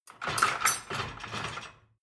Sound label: dishwasher cutlery basket take out